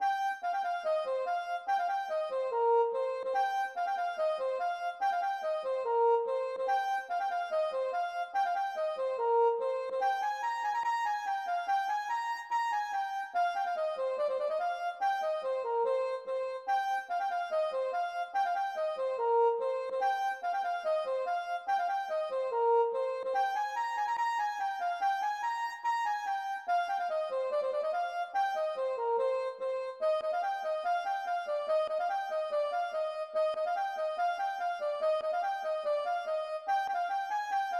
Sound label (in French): gavotte; Gavotte pourlet No3